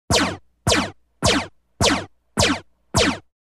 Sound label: Synth Energy Zaps 3 Mu, zaps; Multiple Bursts.